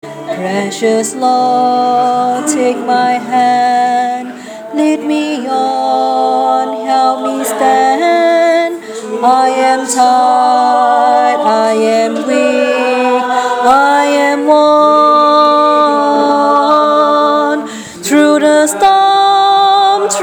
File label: alto